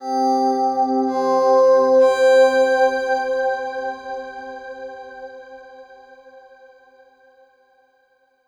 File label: Overlay Tone; Act3 Rezoning